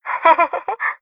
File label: giggle